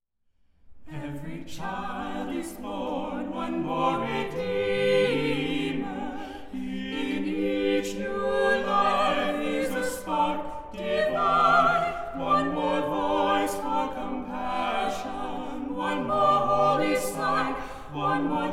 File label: SATB First Readings